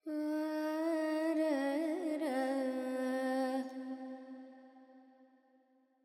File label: FX, Vocals, Carnatic Female, Alaps, Charukesi; Sample Banks